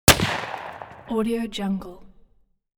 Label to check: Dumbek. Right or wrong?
Wrong